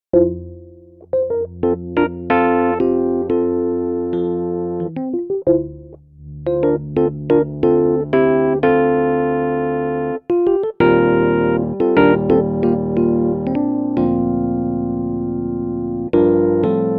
Vintage EP Twin boost

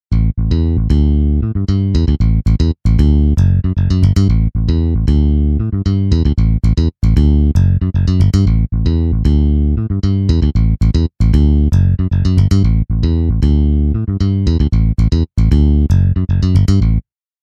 yamaha trb 5 string, basses; montage

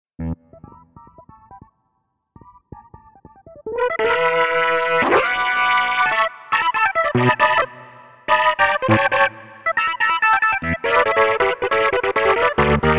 Rock; live instruments; Andrea Rock AF1-2 AT

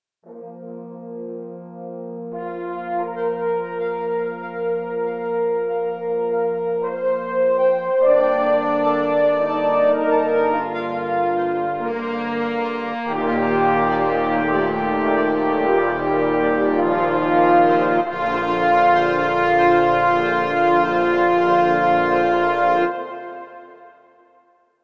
French Horns Section, brass; montage

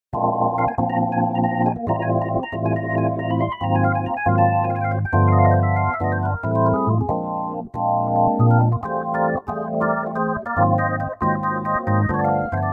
Jazzy, organ; montage

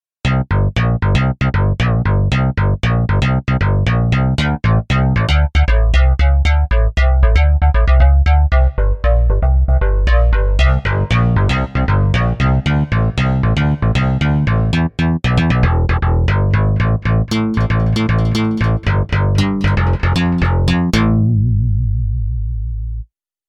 FM DX Bass; montage; basses

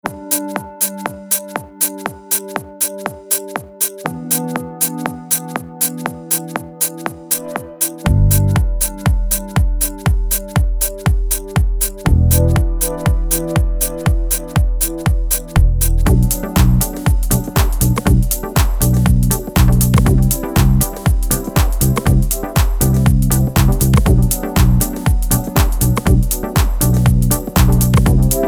club montage

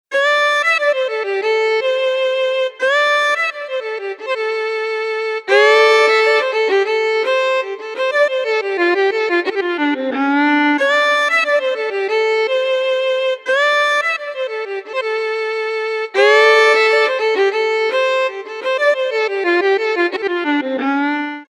Celtic Violin; montage; cordes

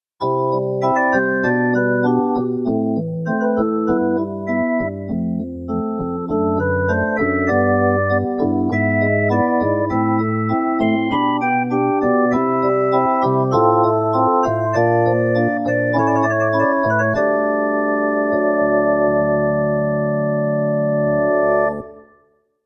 FM Puff Organ; montage

